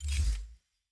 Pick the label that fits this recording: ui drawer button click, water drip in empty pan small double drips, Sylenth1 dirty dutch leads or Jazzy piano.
ui drawer button click